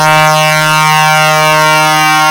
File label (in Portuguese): buzina maritima